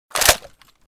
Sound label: barrett mag in